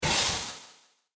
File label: Harsh Impact; Miscellaneous Impact Sound Effects